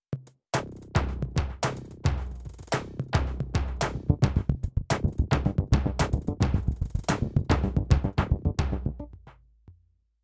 mecanik jingle

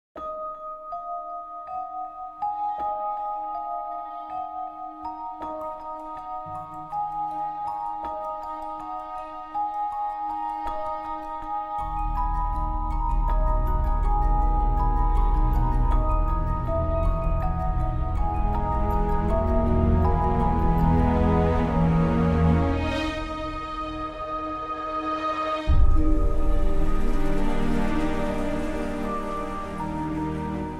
la foret mysterieuse, baton de pluie

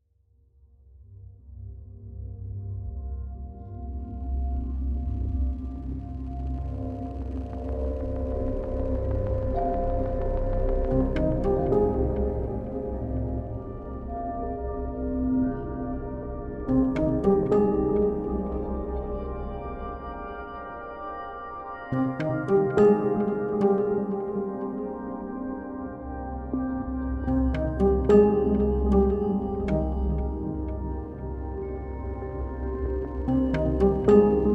impesanteur, tres lent

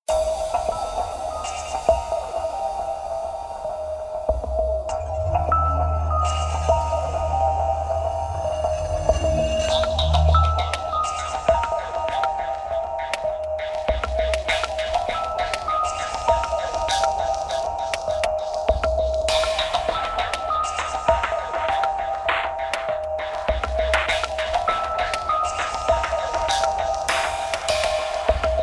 hip hop glacial

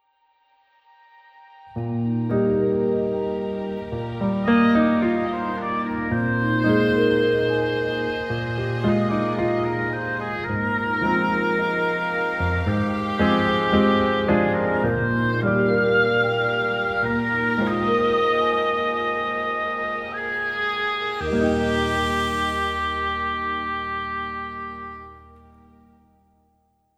gracieuse aigrette

